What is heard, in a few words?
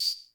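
CR78 tambourine; 90 sSampleCDs; Crypton - DrumBase5000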